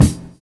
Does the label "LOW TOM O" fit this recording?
Yes